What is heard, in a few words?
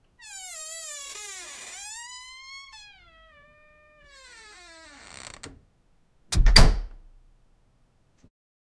sound of doorknob jiggle, latch